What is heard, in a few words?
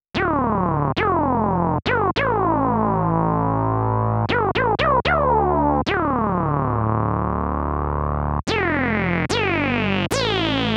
mopho sync; davesmith mopho